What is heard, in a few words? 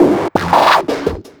loop, 170 BPM, drum & bass